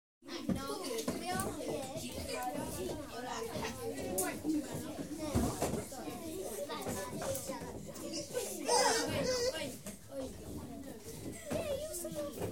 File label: Babies And Children - Noisy Children In Class; Rec. At St. Gilda's Convent, Hampstead